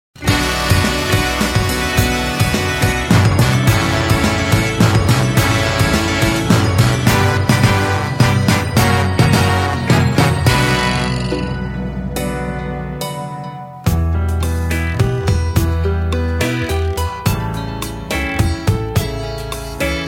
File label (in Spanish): pista, pistas baladas en español